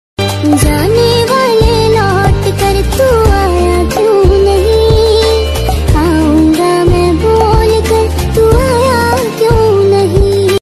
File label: dj remix song ringtone